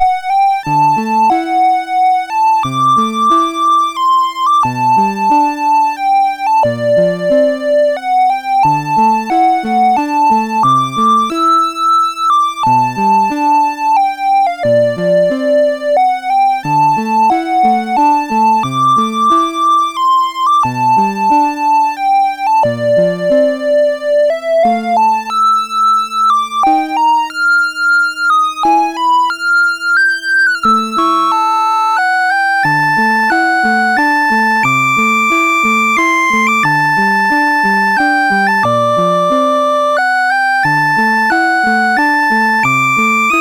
Twins Theme, MOS6581

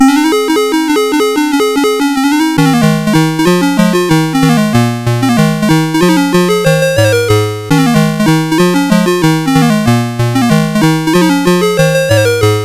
Quari, CSG8580R5

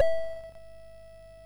sid MOS6581R2; Labyrinth

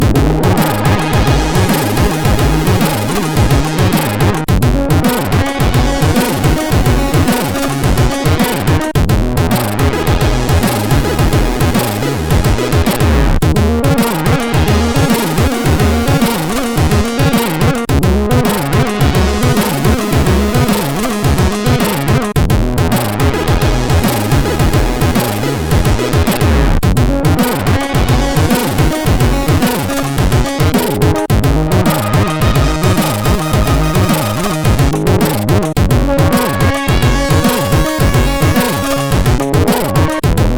Remix, sid MOS6581R2

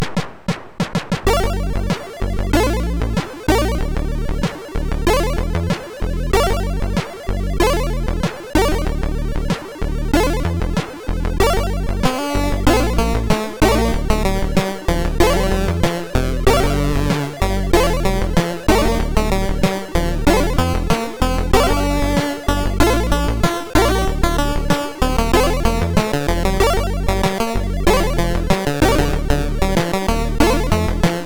Relaxation, MOS6581R2

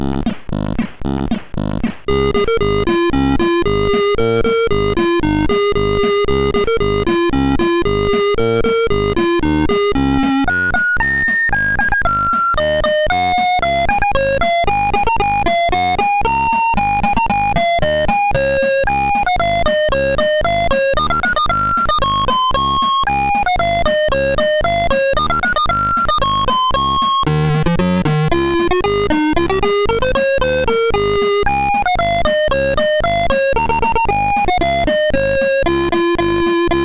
Christmas Medley, MOS6581R2

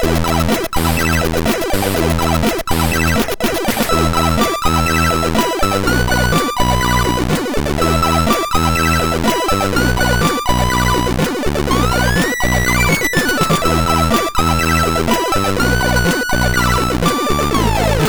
Scroll Mover, MOS6581R2

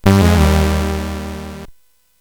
Loopz, CSG8580R5

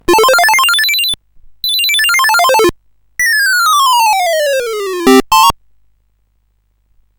Musicbox, sid CSG8580R5

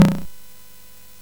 CSG8580R5, GAMES; Street Rod

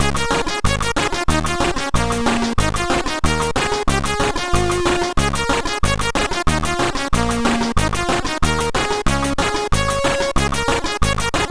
Ragtime

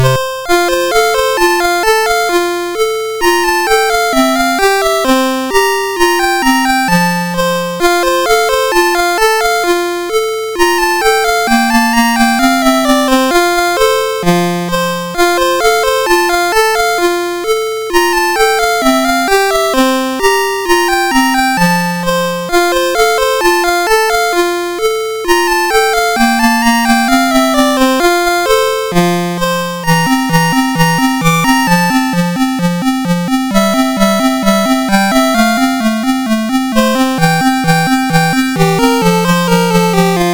sid MOS6581R4, GAMES; Forest of Doom

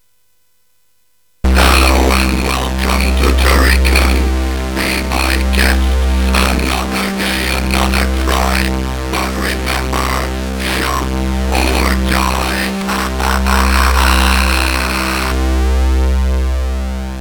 intro speech sid CSG8580R5; Turrican

